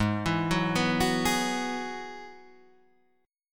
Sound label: G sharp Minor 7th Sharp 5th G sharp, Guitar Half Step Down